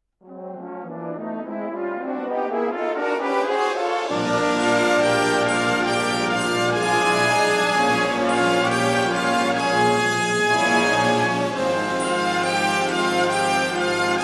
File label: brass